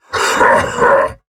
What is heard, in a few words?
Heavy Laugh; Warframe; Battle; Dax; DaxHeavy